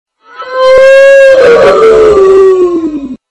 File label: ooof